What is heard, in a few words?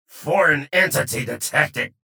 pain ability damage; strogg; low health; strogginfiltrator